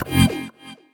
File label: F STAB, funky